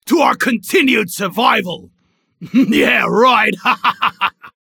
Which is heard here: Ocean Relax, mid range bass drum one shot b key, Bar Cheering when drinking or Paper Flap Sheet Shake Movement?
Bar Cheering when drinking